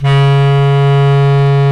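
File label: Bass Clarinet; Roland L-CDX-03 Disk 1; Lo Clarinets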